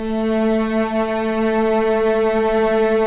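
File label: strings, mf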